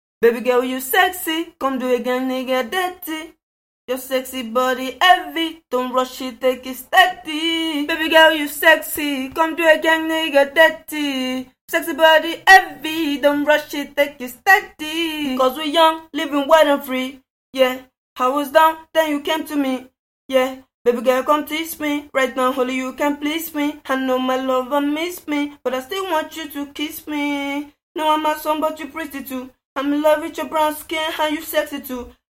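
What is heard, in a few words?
hip hop rapsing 64 CM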